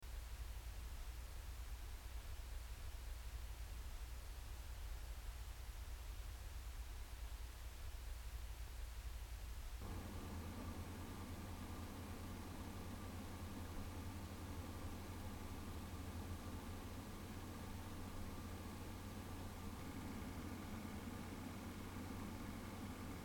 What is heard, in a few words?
fractal mini 5450 load nofans 5v